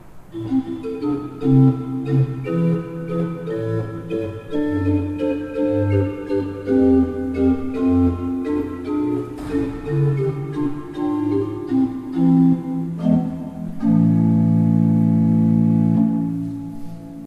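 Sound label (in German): Kreienbrink, pfeifenorgeln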